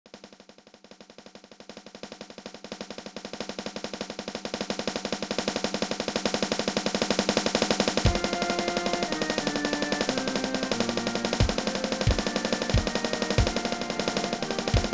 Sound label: perc; JaViElsker